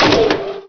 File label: SWITCH